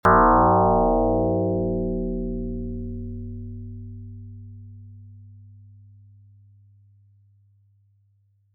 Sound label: chowning Gong; FSound